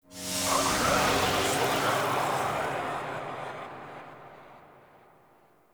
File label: Puzzle Mural Hint; Puzzles; Circade